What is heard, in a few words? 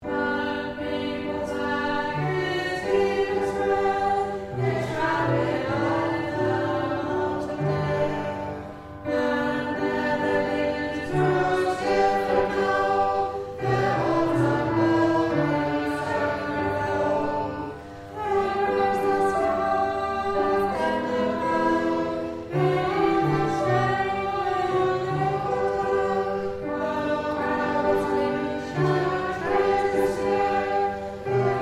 Alto